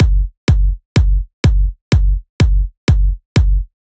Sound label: Kicks, 125